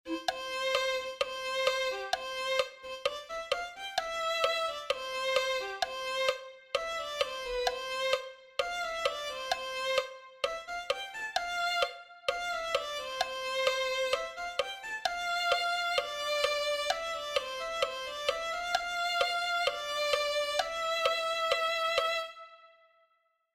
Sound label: violin 2